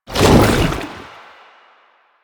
Chem Strike Goo Layer, Footsteps; Warframe; Lasrian; Lasrian Soldiers; Foley; Lasrian Chem Strike Nox

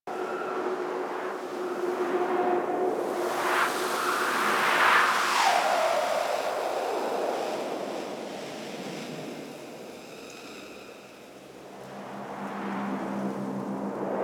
Landscape Wind Low Loop; Warframe; Ambience; Venus Open World

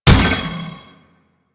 Bounce Projectile Hit; Warframe; Trapper

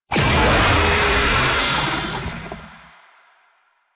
Infested Oni Vocal Player Spotted; Warframe

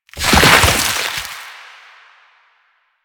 Proc Cold Max, Sounds; Mods

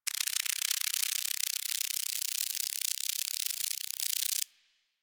Forest Insect Rattle Long; Warframe; Ambience; Grineer Forest

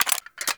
Pistol Reload, weapons; Warframe; Thanotech

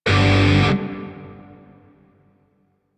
Shawzin Pent Maj Chord G; Lotus Shawzin; Warframe

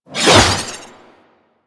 Sword Whoosh

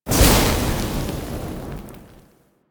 Firepit Rekindling; Warframe; Duviri; Easter Eggs

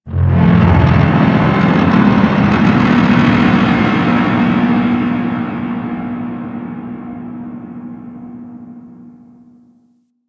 Ocean Underwater Sentient Metal Creaks; Ambience; Warframe; Grineer Ocean

